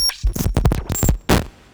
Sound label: Glitch